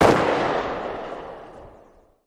aug distant; weapons; Counter-Strike Global Offensive

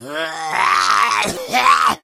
smoker alert, voice; Left 4 Dead 2

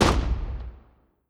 infantry fire standing

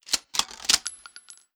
infantry rifle cartridge; Hearts of Iron IV Death or Dishonor

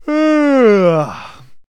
man yawn; Mount&Blade Warband

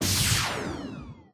infector; Alawar; Star Defender 4

